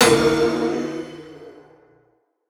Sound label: ghost impact, halloween